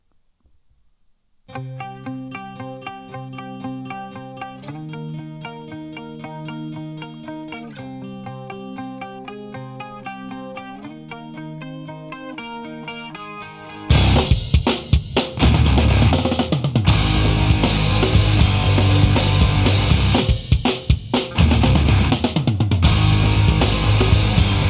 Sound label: jbl110bt record eq